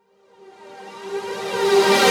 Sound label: Reverse Synths Gmin; Effects; Vengeance; Essential Clubsounds Vol.4